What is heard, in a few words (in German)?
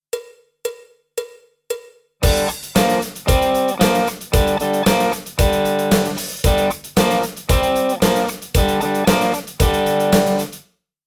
Rock Lick, E-Gitarren